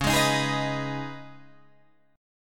C sharp 7th Suspended 2nd, C sharp, down, Guitar, Drop D